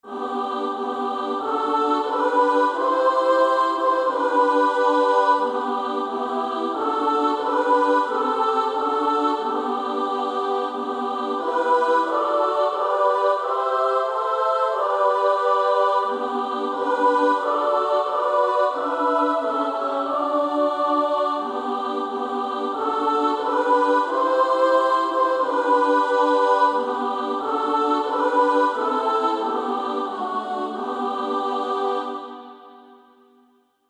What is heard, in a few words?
gracious spirit dwell in me SSA